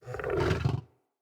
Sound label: Tame Interact Sleep Roll Prone; Enemies; Kubrow